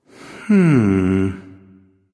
thinking; chiron

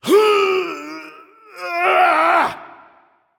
grunt lift

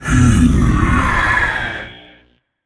breath, voicepack; smite; jorm

